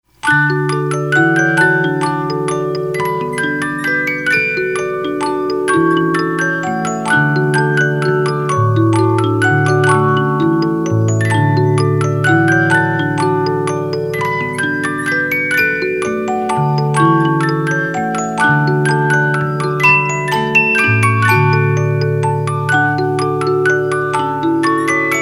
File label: Minuet, 50-Note Movements, music box; Bach